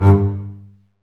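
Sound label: strings, contrabass marcato; String Master Series; Cb Bowed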